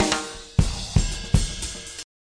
120 break, drum loops

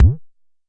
Jomox MBase, drum machine; Jomox MBase 01

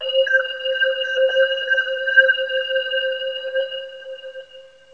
bell pad; Pads

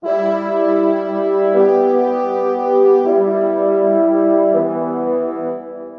160 french horn melody; HORN LOOPS

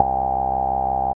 filtery synth bass

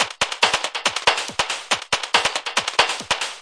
140 electro thin industr, drum loops and breaks

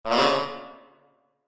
saxophone blurp; Cartoon FX

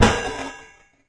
Processed Ethn Perc, ETHNIC and WORLD PERCUSSION

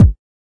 kik

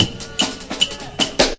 151 live dnb, DRUM LOOPS and BREAKS

